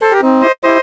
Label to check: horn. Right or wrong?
Right